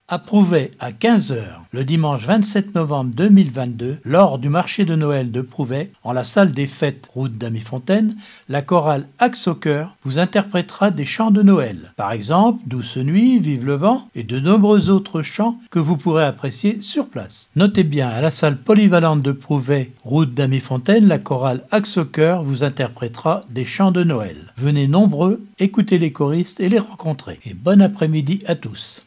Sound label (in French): chorale; AXO CHOEUR; MARCHE DE NOEL; PROUVAIS